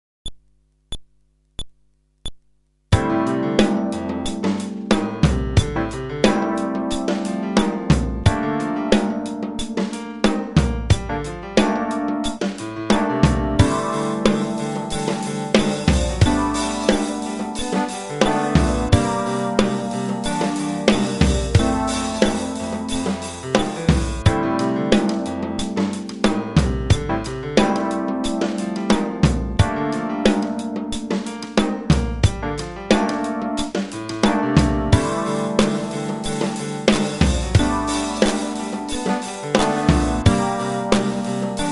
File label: Groove, fretless bass